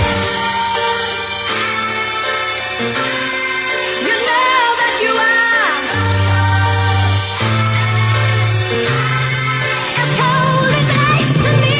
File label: sampled music loops; foreign3r